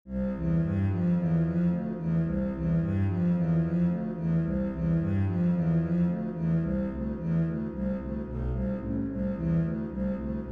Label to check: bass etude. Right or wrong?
Right